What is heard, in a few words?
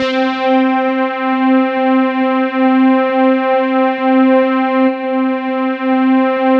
PAD, SynthLine; 90 s Sample CDs; NiceBeats; SynthLine VOL-2